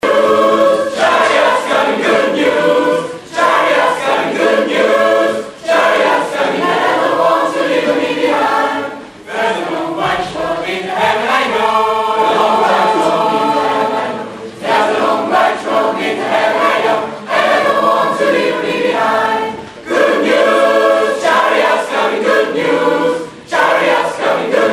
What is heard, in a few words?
good news concert; live au miroirs